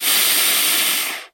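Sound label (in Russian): зловещее шипение змеи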